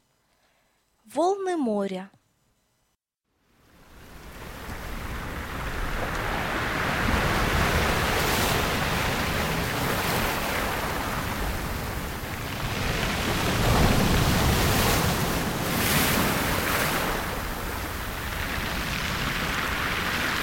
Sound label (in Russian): Волны моря